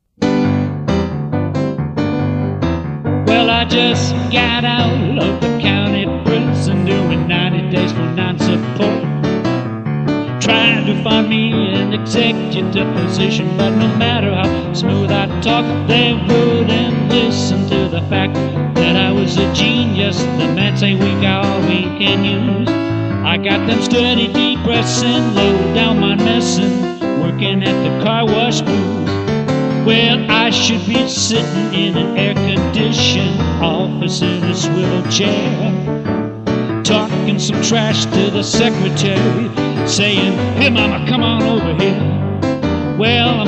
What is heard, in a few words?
Carwash Blues